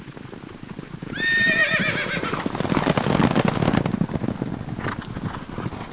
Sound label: Gallop